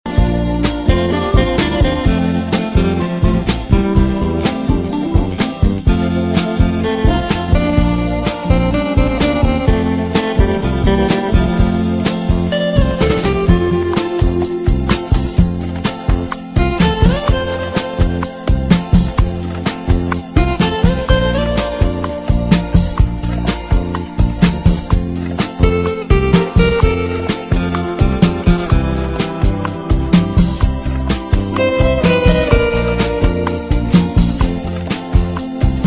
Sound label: No Voice Karaoke Track